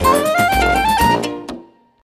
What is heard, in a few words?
mus cage forcefield; rayman origins 2011 gamerip; jungle world